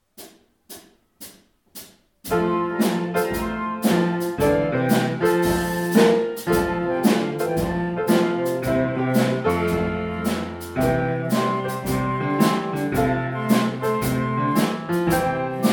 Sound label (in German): big band froehliche weihnacht